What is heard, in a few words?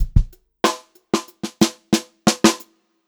groovy fill, Funk-Groove Drumloops; USB Soundscan vol.38; AKAI